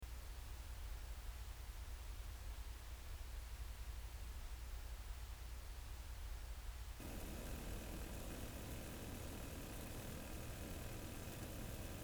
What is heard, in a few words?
nzxt kraken x61 pump 9v fan 4v 5v pump 12v fan 4v 5v 6v